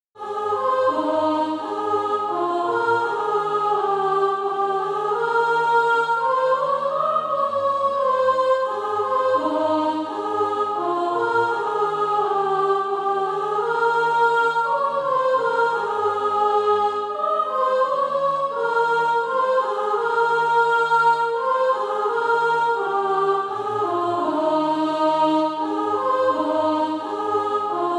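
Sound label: soprano, SATB Practise Music; Easter Offerings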